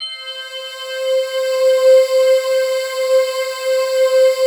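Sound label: PAD, atmospheric pads; Best Service; XX Large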